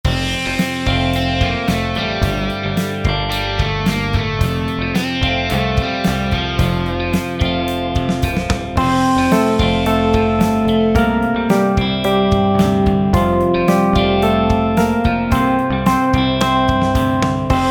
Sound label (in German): Playback F Dur